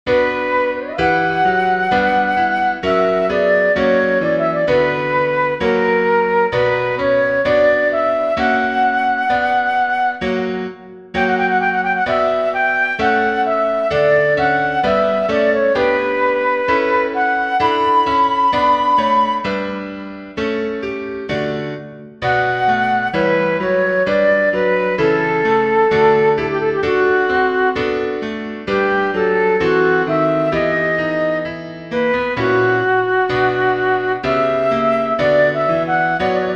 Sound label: chorale for flute piano; bach johann sebastian; herzliebster jesu was hast verbrochen